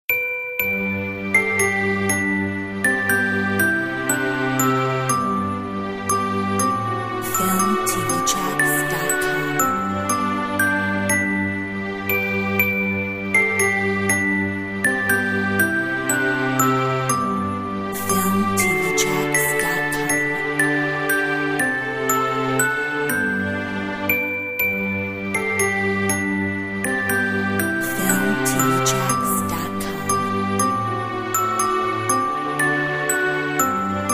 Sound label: Away in the manger 120 bells